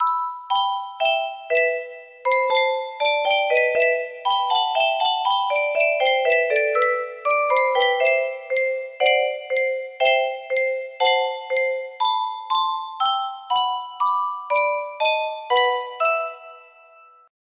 music box; music box maniacs

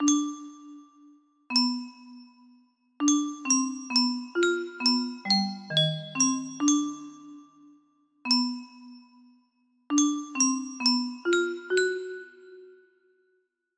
musicbox; music box maniacs

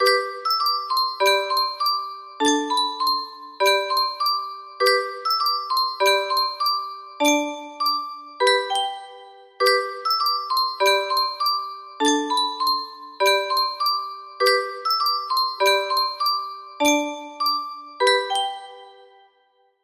london bridge is falling down music box; music box maniacs

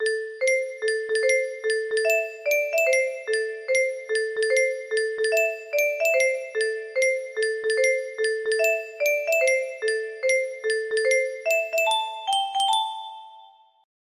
music box; music box maniacs

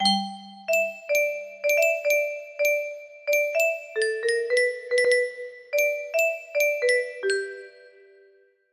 love test, music box; music box maniacs

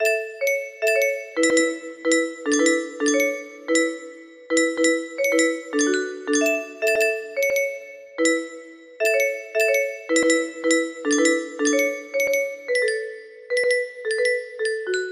novas lullaby, music box; music box maniacs

